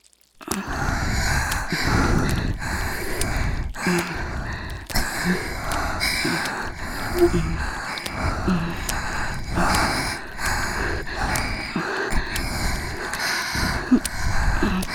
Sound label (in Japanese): 耳舐めソープ